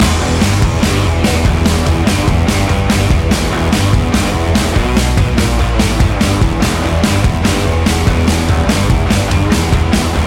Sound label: rock commercial loop